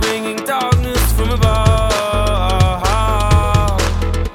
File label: music; wu xing; earth